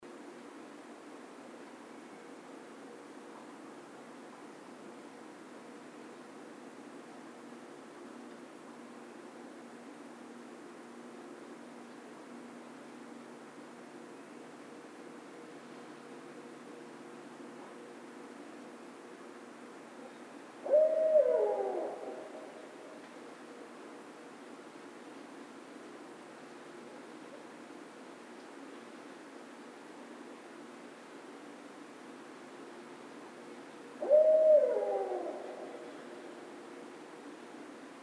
Barred Owl

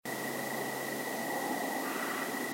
Wood Knocks; bigfoot

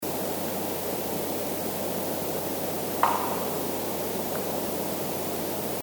Wood knock

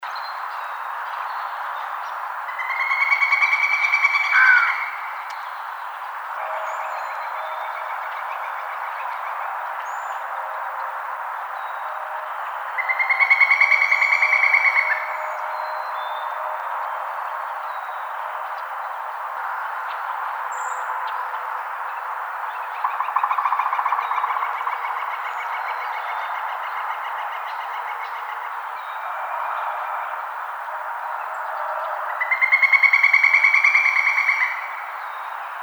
Northern Flicker

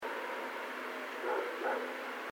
Woohoo

